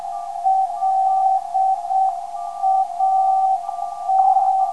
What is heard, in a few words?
LAT 377kHz